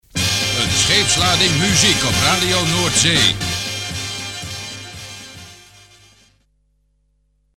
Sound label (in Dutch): Scheepslading Muziek; ZeeZenders